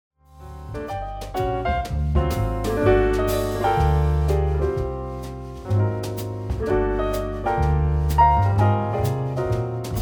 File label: Siciliano